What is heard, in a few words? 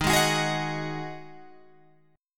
Eb Minor Double Flat 5th Eb Guitar Open G